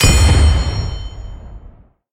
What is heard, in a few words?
logo Land; rage of the seas